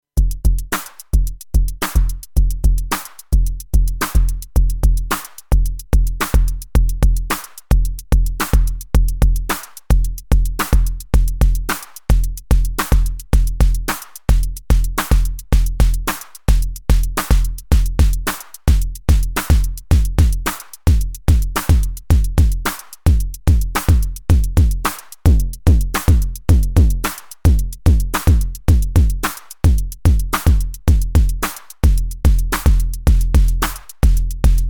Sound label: Kick